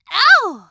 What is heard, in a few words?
attacked; pauline; SM64 CO-OP